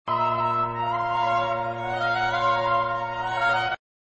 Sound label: D american baroque; MagnaLoops